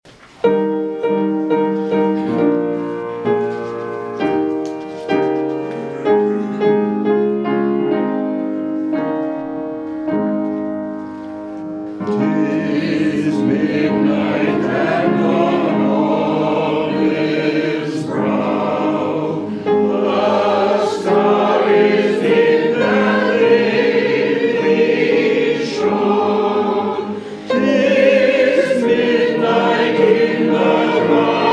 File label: Hymn; 'Tis Midnight, and on Olive's Brow